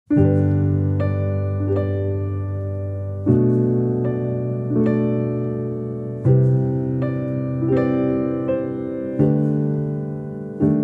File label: song calm nature; dolphin tunes